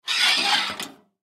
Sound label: Film Light Arri Halogen Lower Stand; Miscellaneous Electronic Sound Effects; Foley; Blastwave